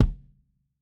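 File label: KICK HIGH HIGH KICK, analog recorded drum; Sonimus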